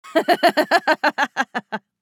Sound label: Crazy female evil laugh